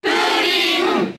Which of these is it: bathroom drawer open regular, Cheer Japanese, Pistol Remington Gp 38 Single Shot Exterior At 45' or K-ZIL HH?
Cheer Japanese